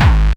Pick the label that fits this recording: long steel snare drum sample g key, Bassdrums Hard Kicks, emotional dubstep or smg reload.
Bassdrums Hard Kicks